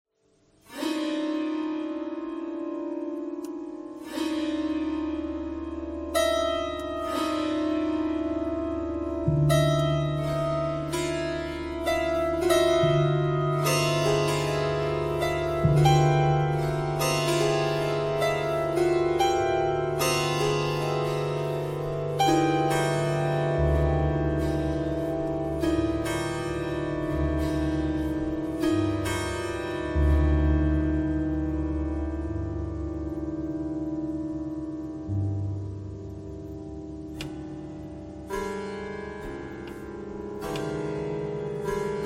piano thread noise

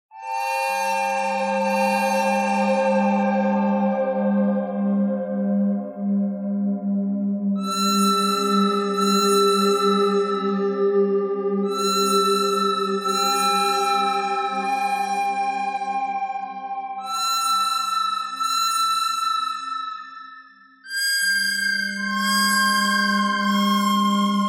bird thread pentatonic; noreverb

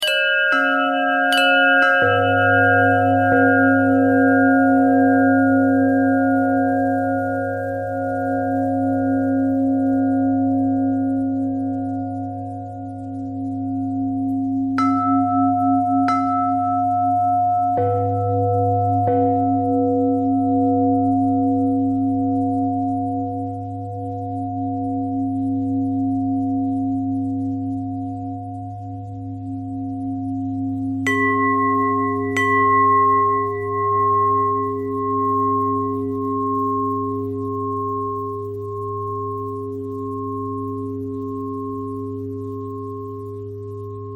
bell bell thread simple, bells

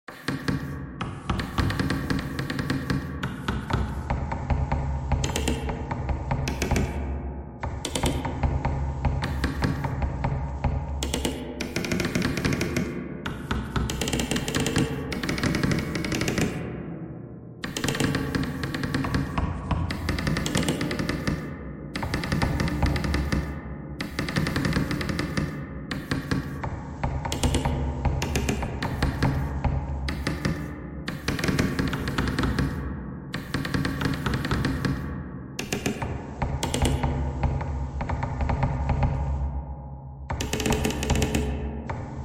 bagpipe thread noise; reverb

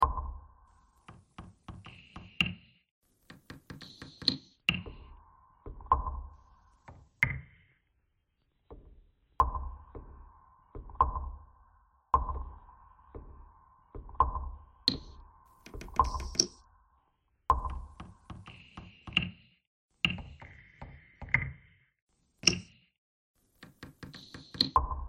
bagpipe simple

